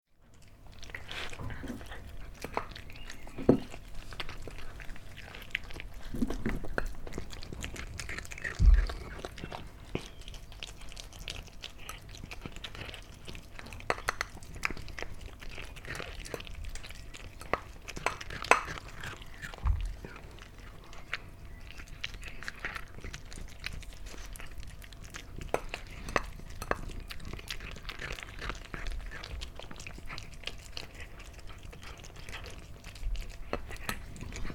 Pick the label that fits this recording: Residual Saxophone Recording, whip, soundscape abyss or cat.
cat